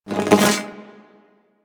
Projectile Whizz By; Enemies; Abilities; Sentient Brachiolyst

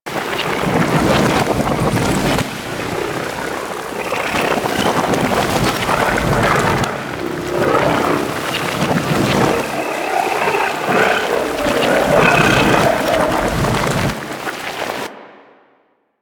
Reverse Sand Fall; Warframe; Man In The Wall

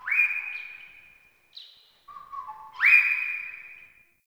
wetland forest day bird distant; Ambience

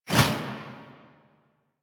Enemy Death Explosion; Warframe; Caliber Chicks minigame

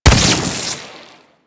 Adhesive Fire; Enemies; Abilities; Warframe; Corpus; Arachnoid Hunger

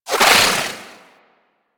Bow Whizz By, Weapons; Warframe; Corpus

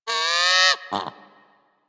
Sea Bird Vocal Captured Idle; Warframe; Hunting Critters; Ostron Sea Bird

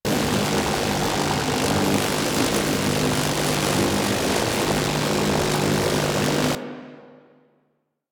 Beam Loop; Duviri Medusa Puzzle

